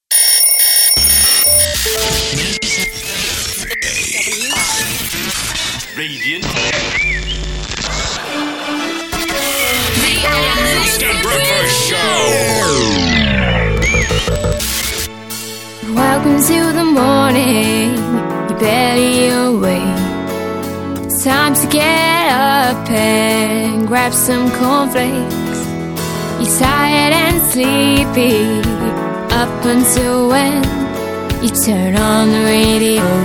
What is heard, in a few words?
jingle; klfm